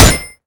coin gun; garrysmod; weapons

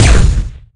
plasma hit; garrysmod

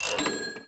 cash; garrysmod

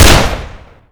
usp match; garrysmod

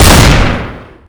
jackhammer; garrysmod

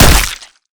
hit flesh; garrysmod; fubar

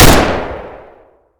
fn fal; garrysmod

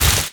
frostgun; garrysmod; weapons

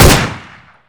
new ump; garrysmod

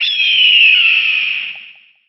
hawk dive; garrysmod

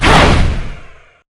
banshee phase in; garrysmod

